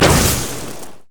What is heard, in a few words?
grasping roots; Zyra; hellfire